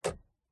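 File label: Citroen C2 Turn Signal Lever, interior driver; DPA4060; PMSFX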